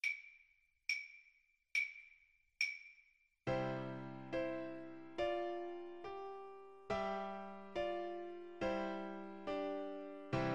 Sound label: beethoven ode to joy kar, Flute